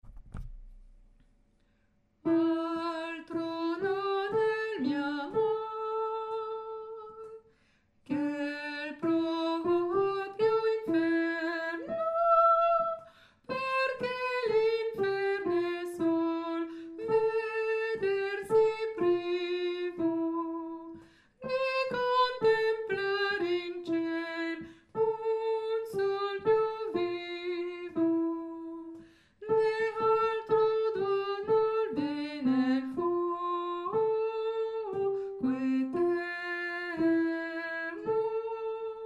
hommes, chorale; repetition agen septembre 2024

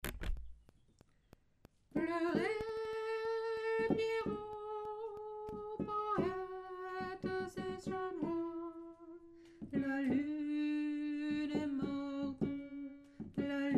la lune est morte alto, choeur femmes

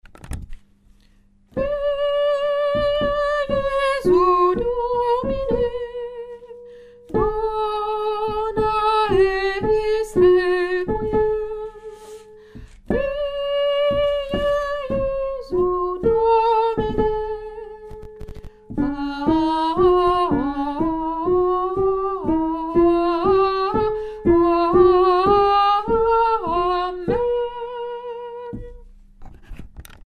lettre C soprano, chorale

